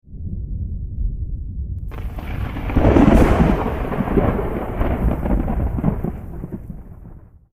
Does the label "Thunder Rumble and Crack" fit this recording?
Yes